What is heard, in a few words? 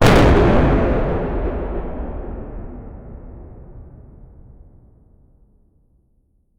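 a meteor hitting the earth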